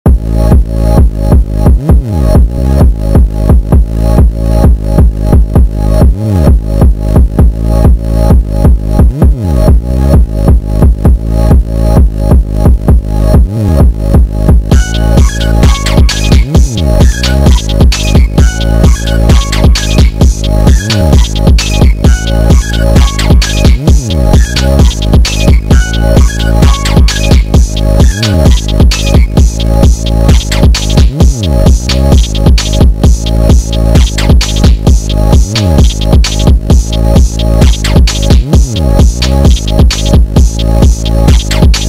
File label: Instrumental